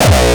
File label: kick; shock. Ultimate Kicks vol. 2